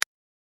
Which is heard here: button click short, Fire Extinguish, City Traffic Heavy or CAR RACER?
button click short